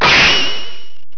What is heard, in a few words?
clashing